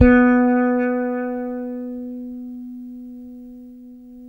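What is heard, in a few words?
fretless bass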